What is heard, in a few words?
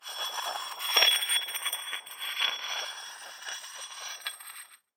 Hole Saw Scrape; Hole Saw Bits